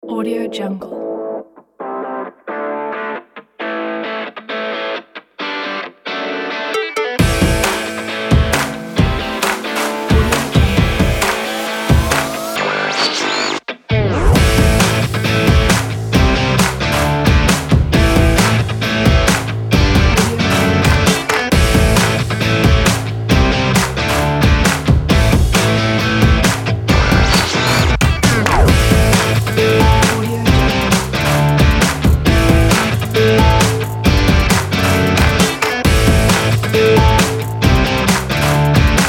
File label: upbeat sports rock news kit